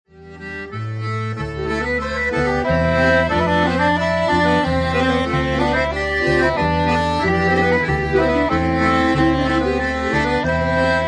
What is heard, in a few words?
Hanter Dro trad, balfolk